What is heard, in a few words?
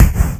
gunshot; Weapon-Explosion